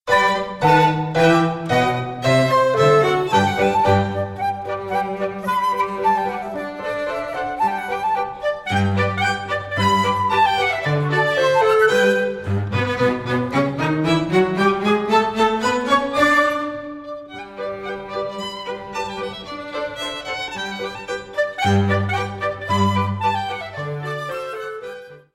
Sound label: Gallopade